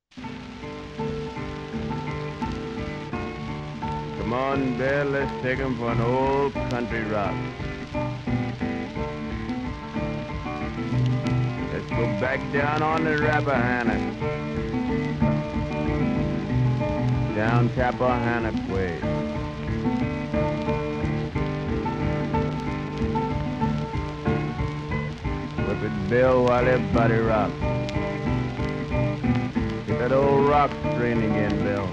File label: Old Country Rock; rootsmusic